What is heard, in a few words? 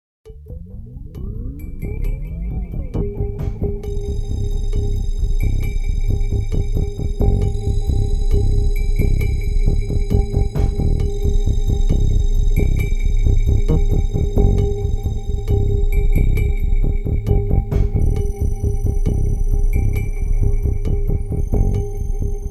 ambient savage; Curiosities Volume I